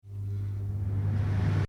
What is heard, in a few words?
Fan (Extractor): Switched On; Electronics; Fans; Stuart Duffield